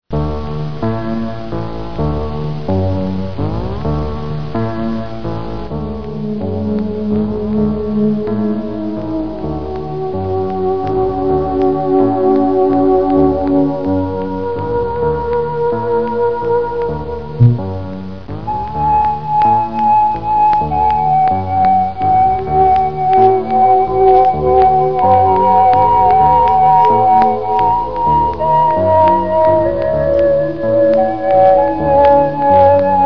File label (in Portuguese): orgao